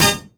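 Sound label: synth brass attack, dance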